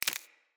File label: Root Spider Step Dirt, Foley; Animals